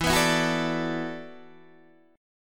E 7th Suspended 2nd Suspended 4th, down, Guitar, Open D